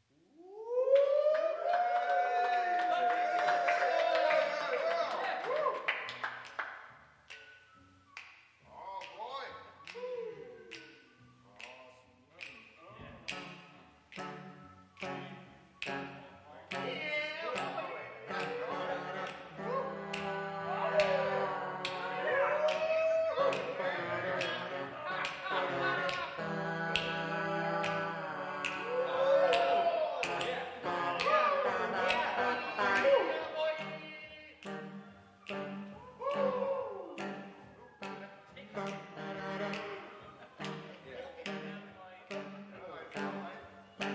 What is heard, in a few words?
Jazz Club Clean